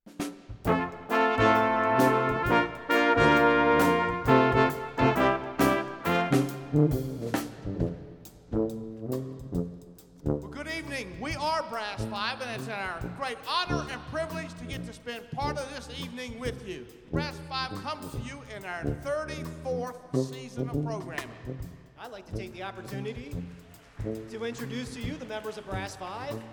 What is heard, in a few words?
Jingle Bells